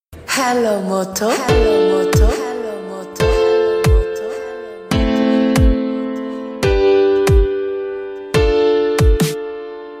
EDM

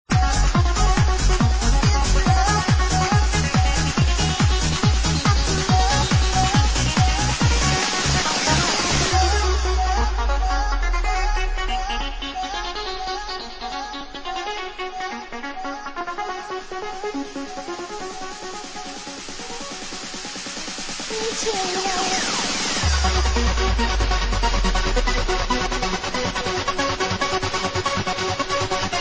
Dance Remix

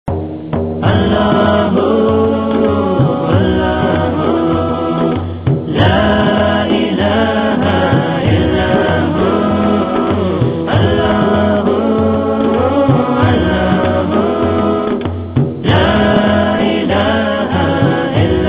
Innocent Tone

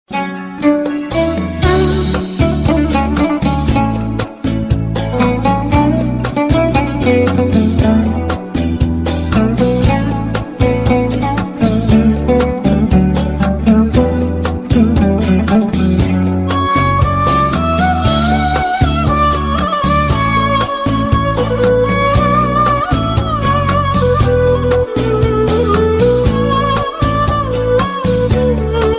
Classical

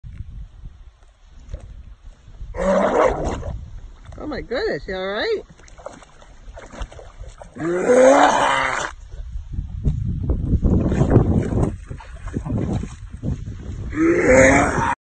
Dog gag

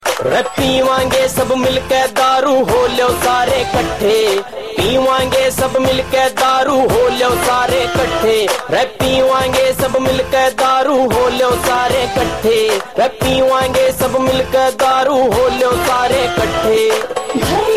Haryanvi

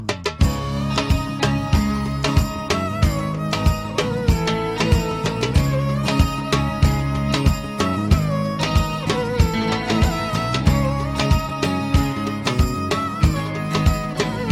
Violin

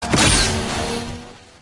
Nitrous Shot

